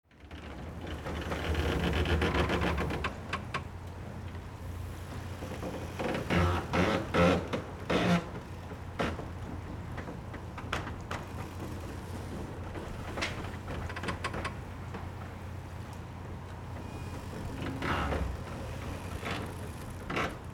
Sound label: dock ambient